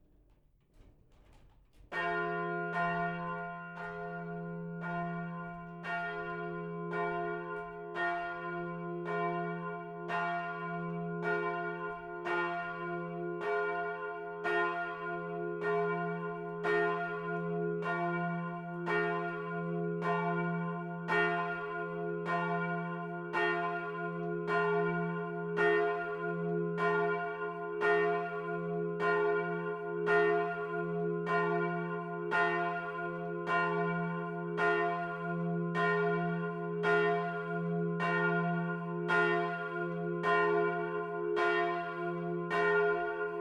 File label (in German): Glocke